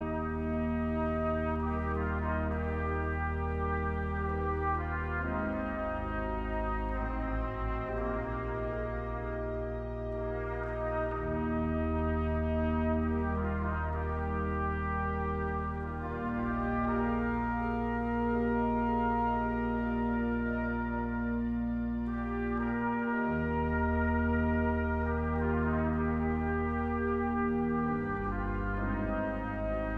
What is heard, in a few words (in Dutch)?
orgel en trompet